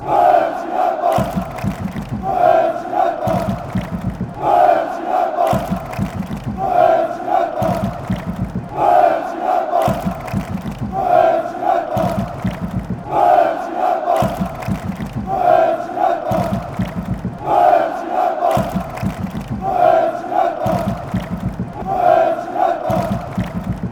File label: chants; moenchengladbach